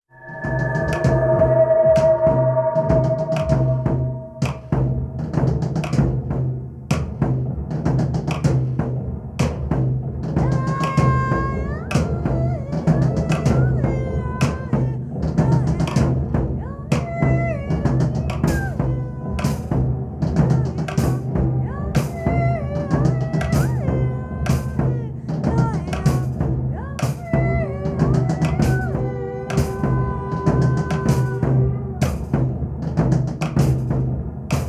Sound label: Percussion